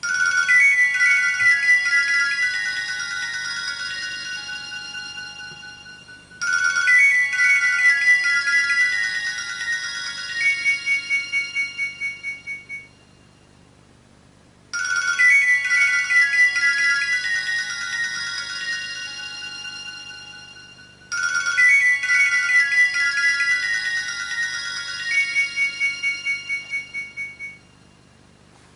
nokia1200 elves ringtone

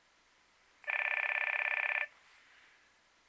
nokia3100 ringtone

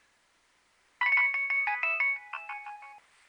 nokia3100 ringtone

